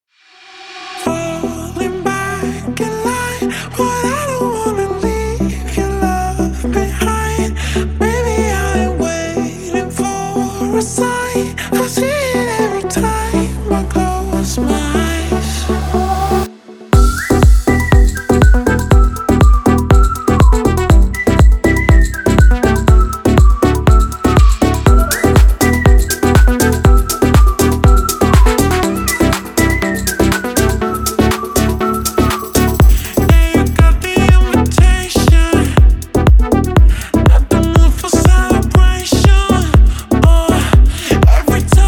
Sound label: whistle song; pop